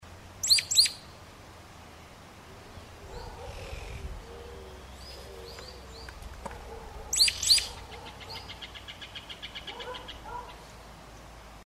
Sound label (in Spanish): CANTO del CHIGUANCO